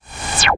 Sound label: Silence; ycrpg